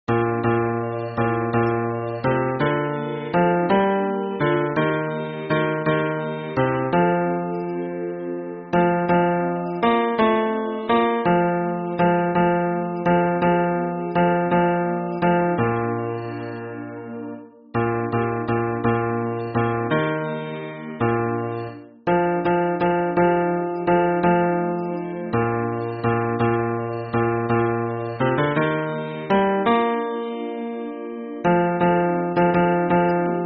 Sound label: hymn bass